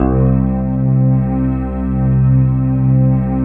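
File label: synth Morpheus; Sound & Vision - Gigapack II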